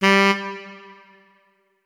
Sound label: notes, saxophone; Hideout Furniture